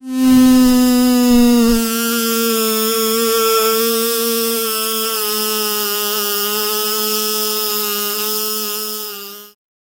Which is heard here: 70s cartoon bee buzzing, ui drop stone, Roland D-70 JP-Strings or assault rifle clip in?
70s cartoon bee buzzing